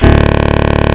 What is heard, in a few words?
SAX BUTT